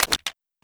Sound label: mw mg open; garrysmod